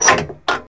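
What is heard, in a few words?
cab switch; Shadow Man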